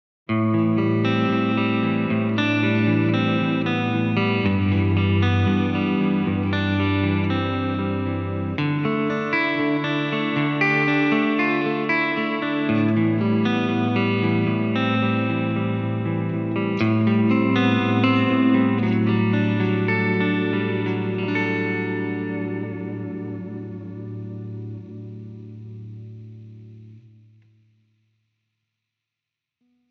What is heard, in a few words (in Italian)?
CLEAN; fender mustang micro